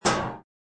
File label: shield hit